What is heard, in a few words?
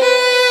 violin; osu